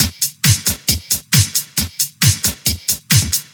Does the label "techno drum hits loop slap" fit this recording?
Yes